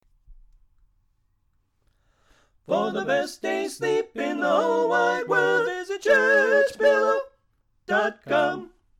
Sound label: Tenor Left; Pennsylvania Groups